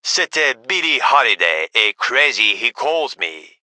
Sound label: music